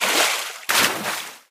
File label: swimming around and doing small splashes, koi; koi princess